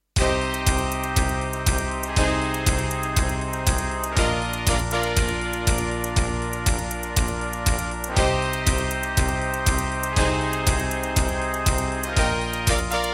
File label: Terratec WaveSystem SCW001-2; Cybernoid II